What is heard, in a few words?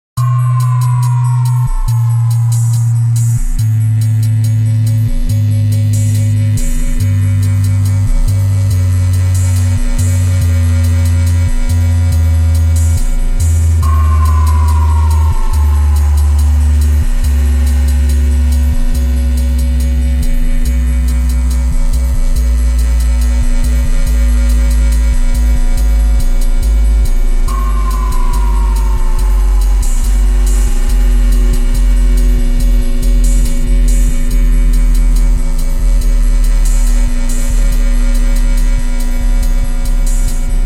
Bass Encounters (Multi-Dimension Bass Test)